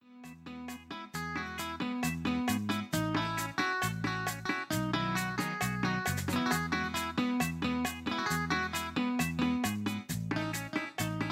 Bachata; ritmos; latino

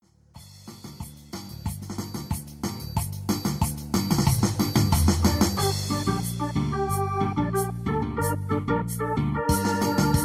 Latin Pop; ritmos; latino

